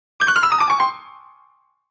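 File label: Grand Piano Fast Comedy; keyboards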